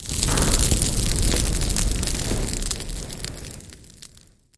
fire crackle; pond5

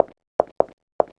walk

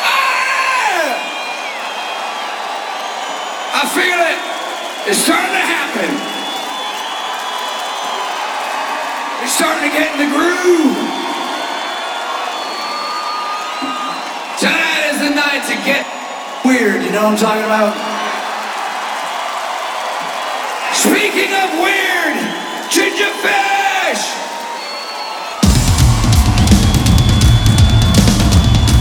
Drum Solo (Live)

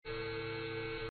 Sound Loader Magnet; coolmathgames

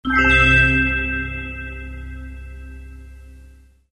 success light

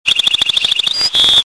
bird; Solitaire

